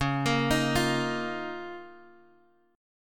C sharp 6th Add 9th, C sharp, Guitar, Drop D; down; chord shape

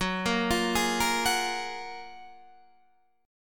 Gb Major 9th, down, Guitar, DADGAD

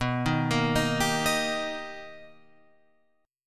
B Augmented Major 7th B Guitar Open F; B+M7; arpeggiated; 11,10,10,10,10,10; JGuitar

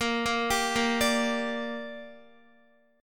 Bb 6th, Guitar; arpeggiated; x,13,10,12,10,10

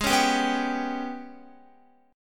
Ab-Minor Major 7th Double Flat 5th, down, Guitar; AbmM7bb5; strummed

